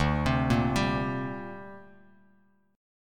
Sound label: D 7th Suspended 4th Sharp 5th down Bass Standard; D7sus4#5 arpeggiated